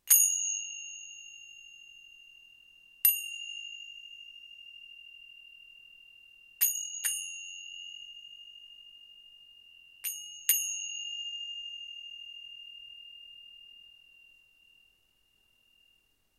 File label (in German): zimbeln ind gross